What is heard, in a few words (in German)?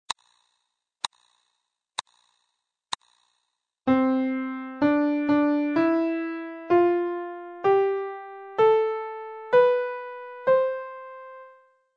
die Dur Tonleiter